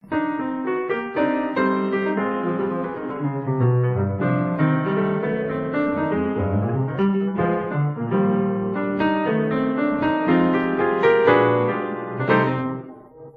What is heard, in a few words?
piano